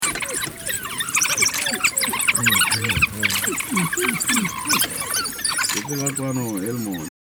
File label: painted dog pups eat